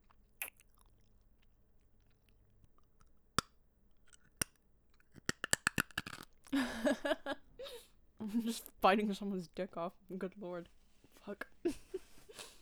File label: CRONCH CRONCH